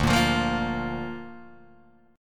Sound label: D Minor Sharp 5th D down Guitar DADGAD